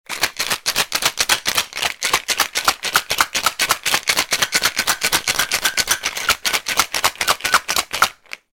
Shake a cocktail shaker

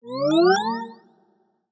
cast spells, magic spell; 魔法/法术的音效

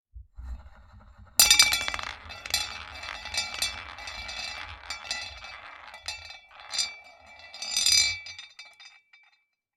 The roulette wheel spins double; board game casino mahjong

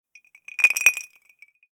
Glass with ice sound

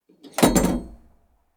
pick up the phone

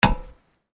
Footsteps Iron plate One

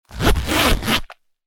Wallet zipper

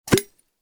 Open the shaker lid

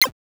8bit FX; 8bit風のサンプル; チップチューン音楽の制作

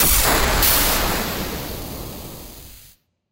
thunder magic large; 魔法/法术的音效

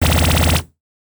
consecutive impact, mahjong; ボードゲームやカジノ、麻雀の効果音です。

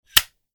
Extinguish the flame on an oil lighter

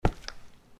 Footsteps Concrete Asphalt Street

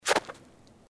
footsteps snow

